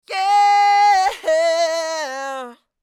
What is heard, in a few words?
2247LE Belting close